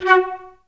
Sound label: FL STAC F#3; Best Service; Peter Siedlaczek's Advanced Orchestra